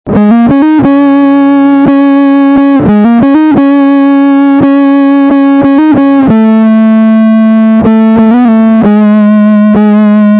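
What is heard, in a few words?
Big Muff Pi, guitar; knob settings in clock terms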